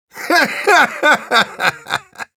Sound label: laugh evil; Engineer